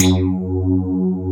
wet bass

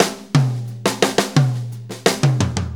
4-4 Fill, Drum Loops; Drum Tools; Fills; Sonic Foundry (Sony Creative Software); Loops & Samples Drum Tools

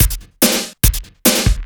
CB LOOP; Zero-G; Chemical Beats

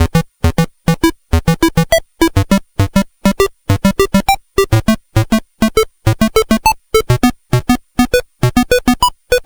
Game Boy Bass; 8-Bit; Chiptune; Nintendo

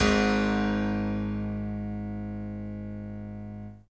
SY99 Piano G#1; Drum & Bass; Total Drum&Bass

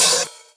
Open HH, Cymbals

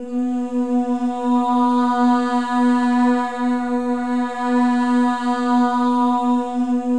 MOVING V4, Symphony of Voices; Spectrasonics

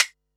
Perc, Percussion and FX; Pearl SC-40

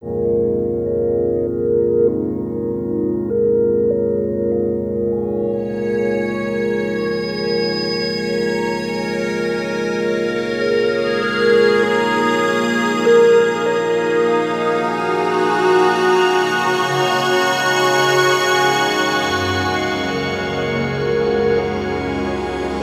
Melodic Solo; Sonic Foundry (Sony Creative Software); Slow Silhouette

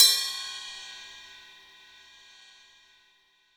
Rock Ride, cymbals; Roland BK-5

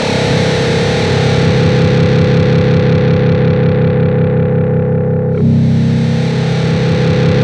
C1, Synth Line; 90 s Sample CDs; NiceBeats; SynthLine VOL-33

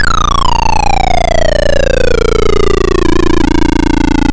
Apple II FX Landing Humming Bird, Chiptune

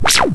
FX; E-MU Formula 4000 Series Vol. 3 – Analog Odyssey; VK+ Instruments

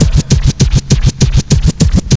scratch; Zero-G; Freak Beats

